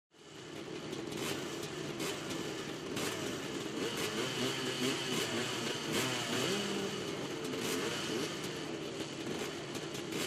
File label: Motocross, roar of engines, regassing, start of races; motorcycle racing